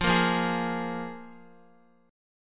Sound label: F Minor Double Flat 5th, Guitar; Listen to Fmbb5 strummed